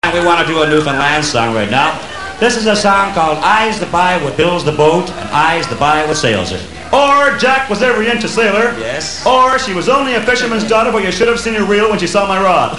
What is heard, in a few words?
Song Intro